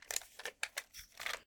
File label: Pickup; Phasmophobia